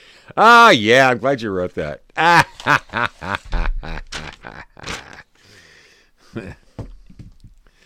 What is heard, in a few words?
Laugh